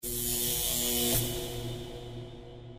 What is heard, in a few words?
laser room electricity; Dystopia